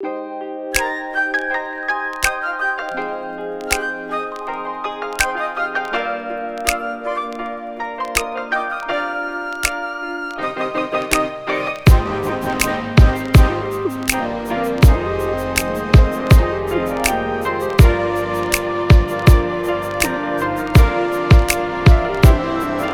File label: 081 Cmin, Smooth RnB